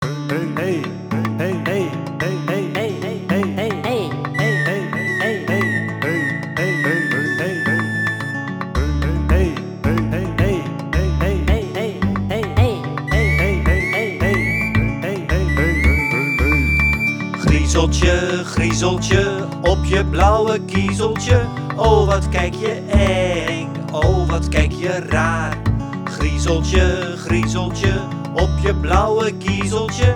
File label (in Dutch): meezingversie